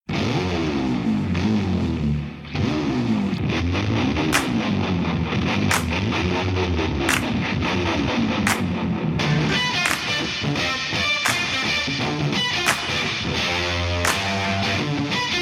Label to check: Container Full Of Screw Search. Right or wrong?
Wrong